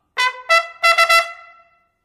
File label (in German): Aufmarsch